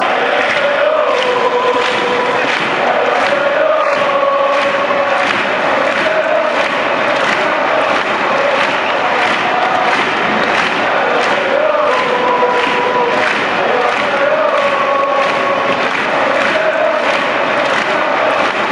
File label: allez allez ho ho